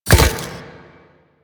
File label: Moa Step; Warframe; Quests; The New War; Veso